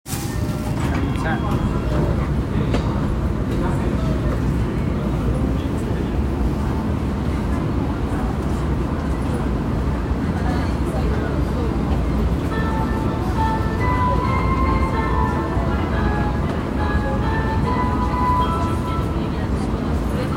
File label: train sounds; 日比谷線銀座北